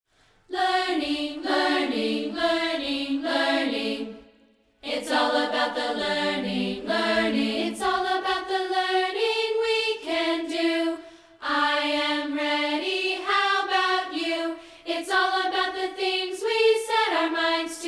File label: a cappella